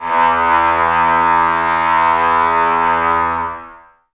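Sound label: brass trombones mute; Miroslav Vitous; Woodwind Brass Ensemble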